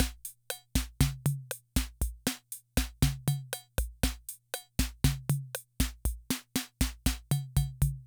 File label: Loop, rhythm 55, classic machines